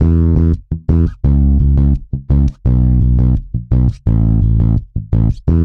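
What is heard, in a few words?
Bass Punk E major 170 bpm; Guitar and Bass; Ableton; Factory Packs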